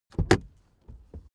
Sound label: suv open door